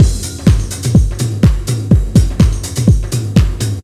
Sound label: big n bouncy, Deep House 125bpm